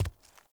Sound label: Walk Default, Footsteps; Characters; Enemy; Mockeries